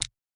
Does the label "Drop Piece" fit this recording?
Yes